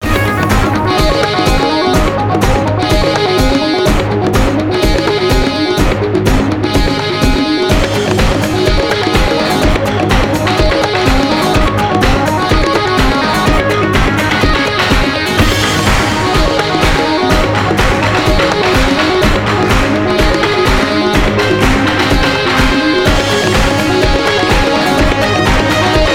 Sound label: Victory (Win); Fuji; Speed Racer The Videogame; PS2; Wii